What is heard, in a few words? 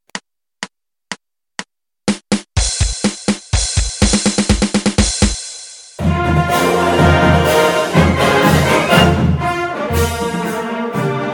National Anthem March with roll-off